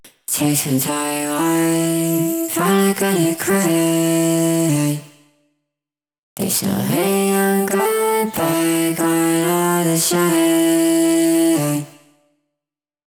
vox

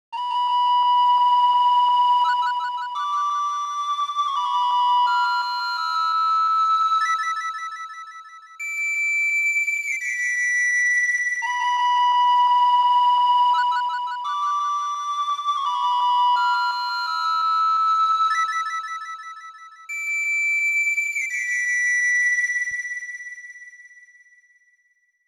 flute

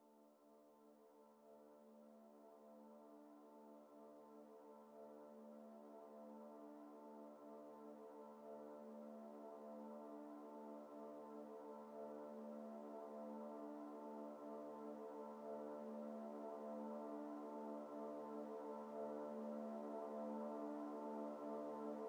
drone

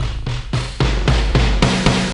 fill

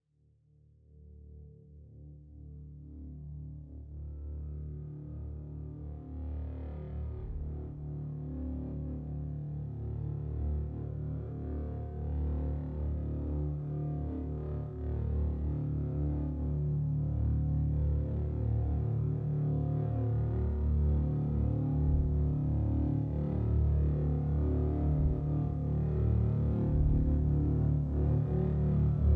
bass drone

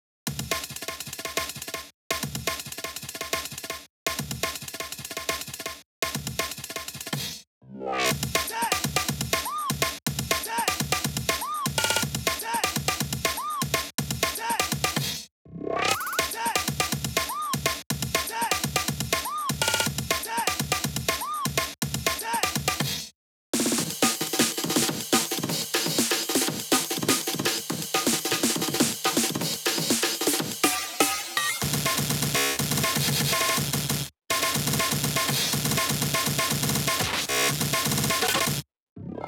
drums

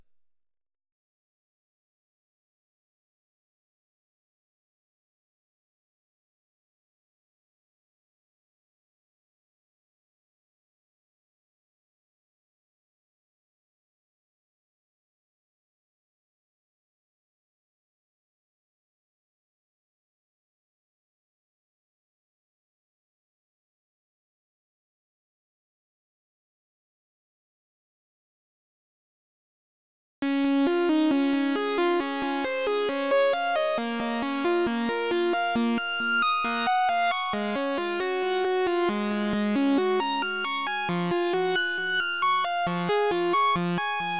melody; racing melody 2

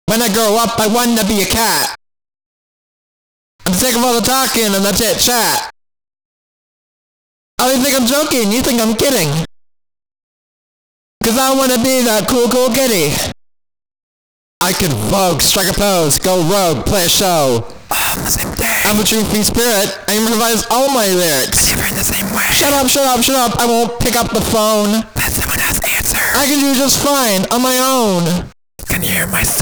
128bpm Vocals

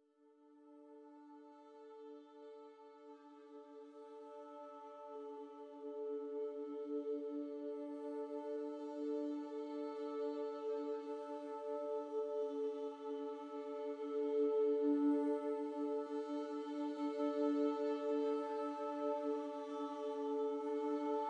pad

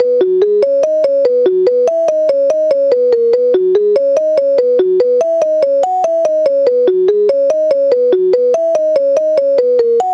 144bpm Synths

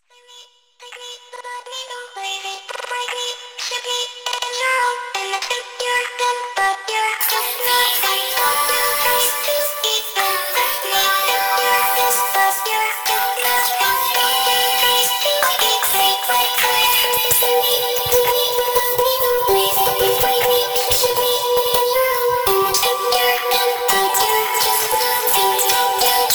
ACAPELLA

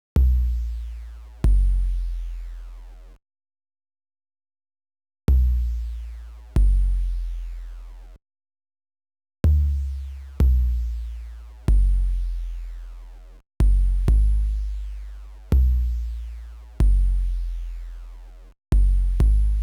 sub

